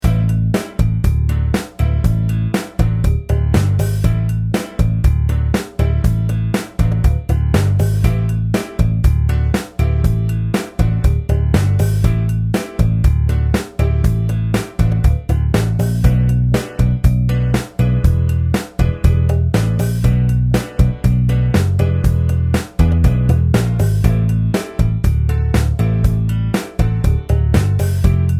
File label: 120 Latin Rock Rhythm